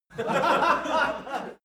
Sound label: laugh male short